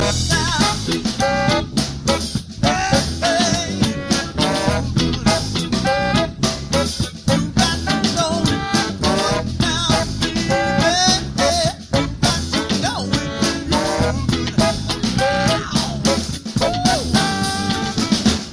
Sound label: Funk